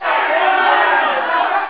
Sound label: crowd